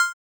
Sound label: Game retro menu move